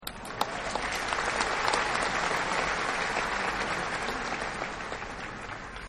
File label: Applause Light; Human; Partners In Rhyme